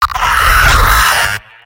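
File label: pain severe, male; tf2; garrysmod; mvm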